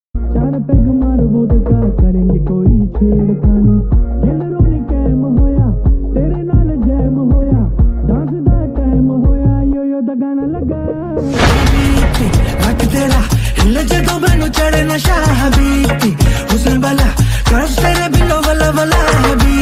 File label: slowed reverb hindi ringtone